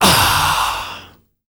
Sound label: AAHHH, World Voices, Afro noise; ILIO; Vocal Planet VOL-5